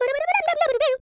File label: SCAT; Spectrasonics; Vocal Planet; Jazz FX; CHEESESCAT